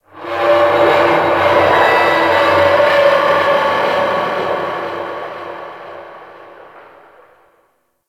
Ocean Pressure Creak; Ambience; Warframe; Grineer Ocean

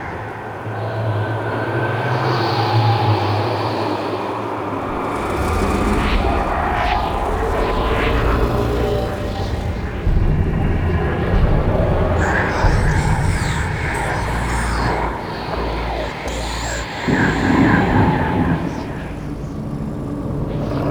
Nightmare Void Loop, Ambience; Warframe; Lotus Eaters; Quests

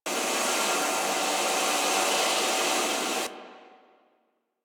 Galleon Steam Exhaust Loop; Ambience; Warframe; Grineer Galleon

